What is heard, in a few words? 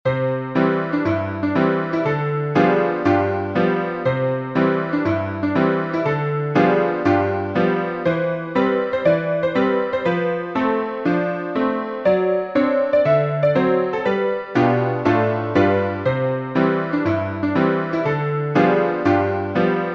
piano, sheet music